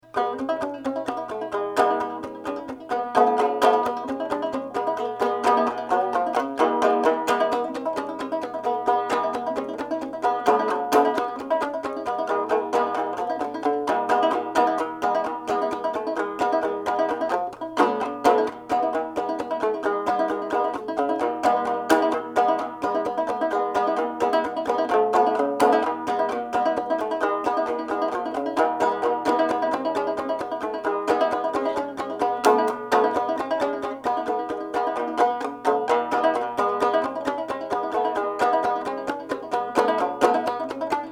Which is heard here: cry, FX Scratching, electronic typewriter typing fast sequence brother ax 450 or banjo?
banjo